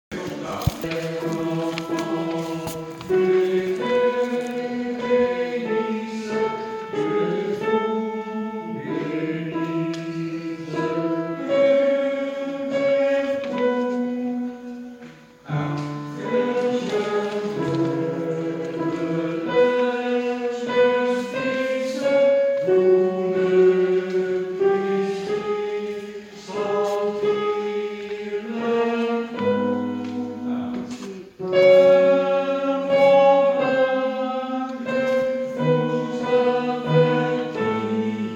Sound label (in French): Le adieu des Bergers Tenor